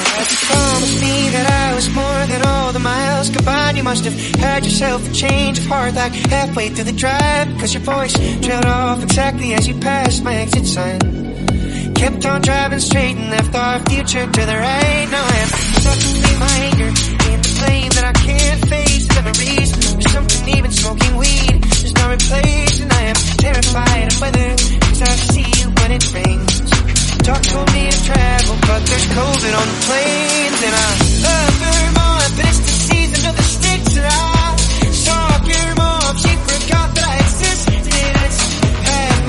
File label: 126bpm Fm